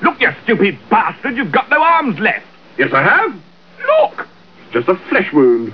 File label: wound